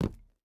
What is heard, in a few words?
footstep rubber boots concrete jump land; Rust